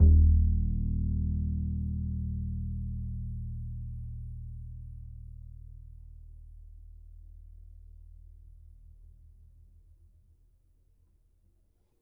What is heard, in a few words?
E, Upright Acoustic Bass